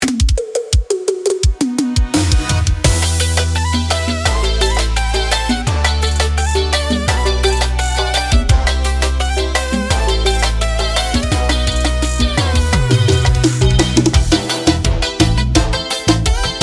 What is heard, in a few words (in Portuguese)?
Piseiro; Netflix